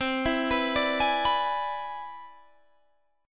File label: C Augmented Major 9th, Guitar, Half Step Down